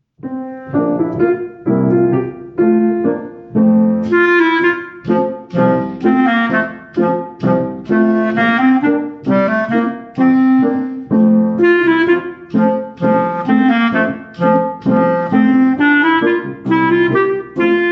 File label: Hornpipe Study with Solo